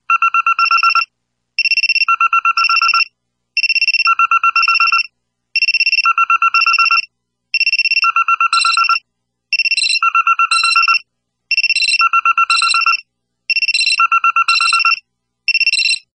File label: nokia lumia alarm